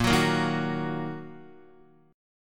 Bb Suspended 4th Sharp 5th, Bb, down, Guitar; Bbsus4#5 strummed; JGuitar

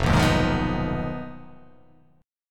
F Minor 7th Flat 5th F down

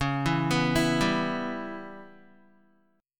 Db Minor 7th Flat 5th Db down Guitar; arpeggiated; JGuitar

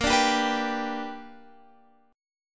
G Major Bb, chord; G/Bb strummed; JGuitar

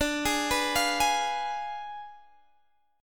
D Diminished 7th D; Ddim7 arpeggiated; x,17,18,16,18,16

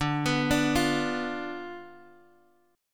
D-Minor 6th, D, Guitar, Open F; Dm6 arpeggiated; x,9,11,9,8,x

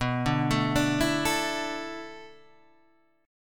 A Suspended 4th B, Asus/B chord; arpeggiated; JGuitar

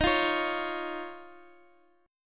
Eb 7th Suspended 2nd, Guitar, Open D

